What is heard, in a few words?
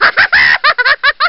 cackle, spooky sounds